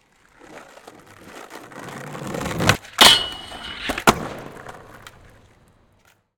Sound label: Skateboard, Jump, Grind, Deck, Rail, Tube, Metal; Rzpost; Skate on Board